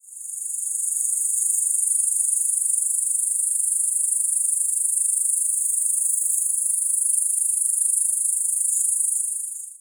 probable Okanagana canadensis filtered, cicadas

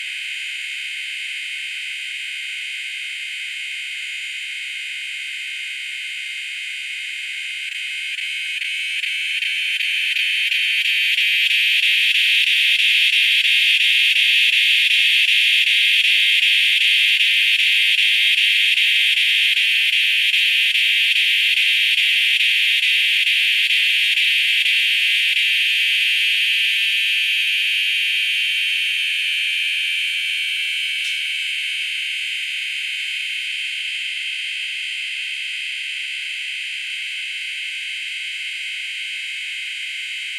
Megatibicen auletes filtered, cicadas

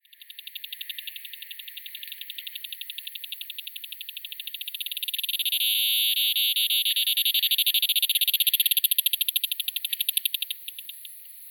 Neocicada chisos filtered, cicadas

